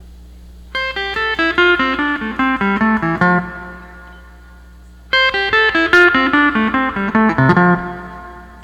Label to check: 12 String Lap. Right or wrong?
Right